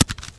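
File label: grass; Mafie 1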